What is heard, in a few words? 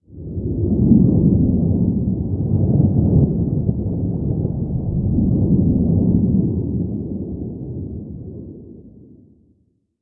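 jet flyover interior; ModernWarfare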